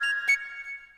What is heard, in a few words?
Bagpipe